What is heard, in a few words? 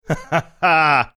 ha ha haaa